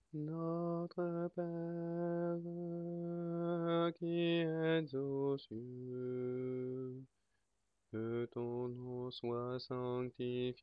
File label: Notre pere basse; mariage; Rimski-Korsakov